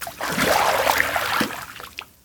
deep water; firefight

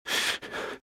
Breathe - Fast Heavy; RadwinIsland

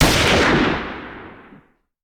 Hand Gun; Sound Ideas; Guns

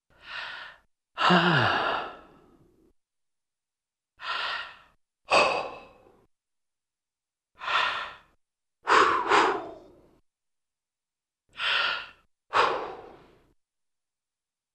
Various Sighs Inhales; Cartoon; Hollywood Edge; Cartoon Trax

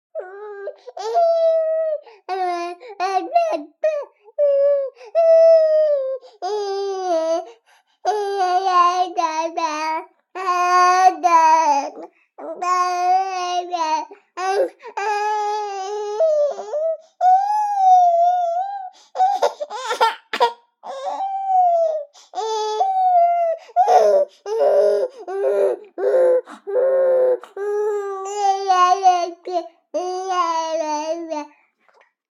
BABIES WHINE Boy 3 months Long; Baby Boom

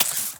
Syringe Inject; SFX Kit; Foley - Misc

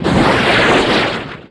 Torpedo Shot, Weapons

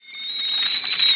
valve shield break; Apotheorasis

